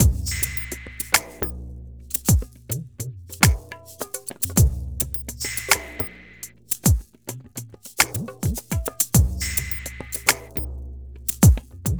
mix 105, Hip Hop India, 105bpm; Hip Hop India Modern Percussion; Apple Loops

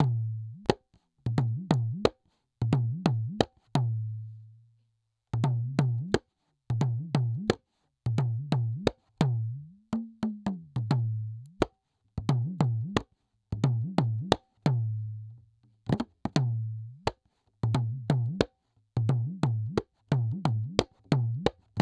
dimdi 088, Hip Hop India 088bpm; Hip Hop India Modern Percussion

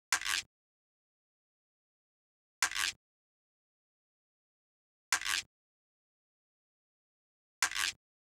Guiro, Hip Hop Exotica, 096 Amin

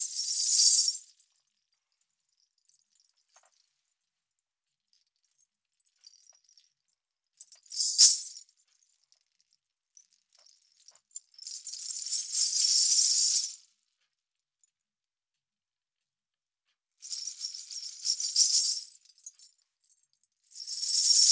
shaker C 090, Hip Hop India; 090bpm; Hip Hop India Modern Percussion; Apple Loops

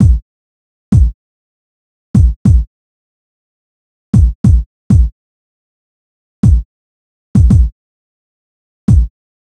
Kick, Drum Tracks, Amin; Hip Hop; big fish audio; Hip Hop Exotica; Apple Loops

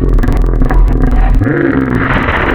Hippo, Wavetables; Cymatics; Serum; Animals for Serum - Gold Edition

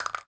Castanet Hit

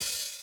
Open Hat Hit, Bmin; Hip Hop; Hip Hop Exotica; Apple Loops; Big Fish Audio

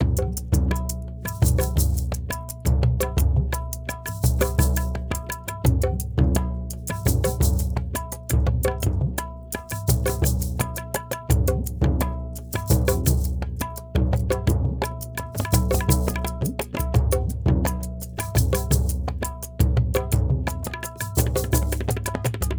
perc mix 085 with duff, Hip Hop India 085bpm; Hip Hop India Modern Percussion

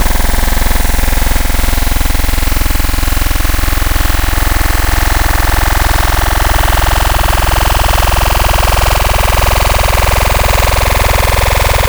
Growl Table, Serum; Cymatics

